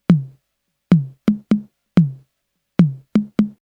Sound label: tr 606 tom; Loop Mania Sampler Pack